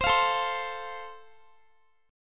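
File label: A Minor Sharp 5th, Guitar, chord strum; x,x,22,22,21,19; strummed; Am#5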